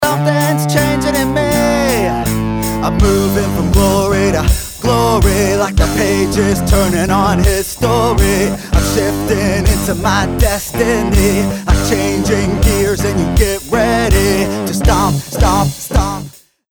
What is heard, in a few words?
bridge lead